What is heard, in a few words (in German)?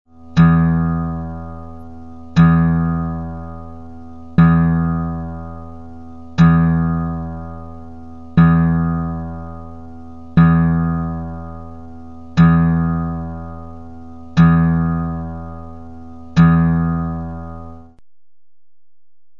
Stimmen E Stahlsaite tief